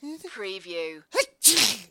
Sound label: Sneezing, Male Sneeze